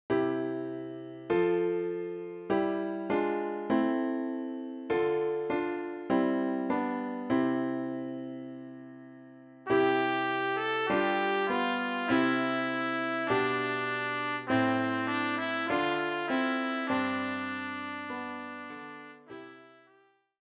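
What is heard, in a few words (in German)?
Alle Jahre wieder Trompete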